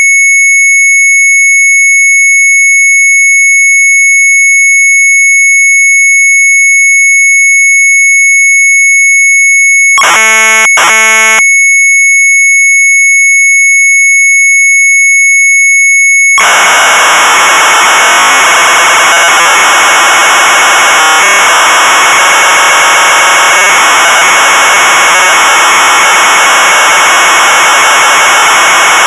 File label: Sharp MZ800 Tape; KLOK & SPEL; retromelsarchive